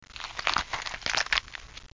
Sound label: splut; alienbreed3d2